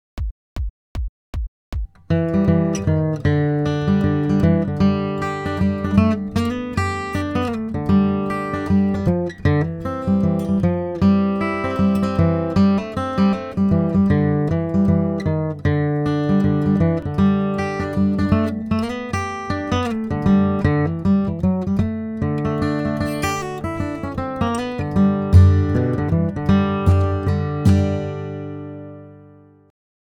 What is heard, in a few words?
guitar Worried Man Blues; Jack Tuttle’s Bluegrass Guitar Primer; upping the game for vocal style solo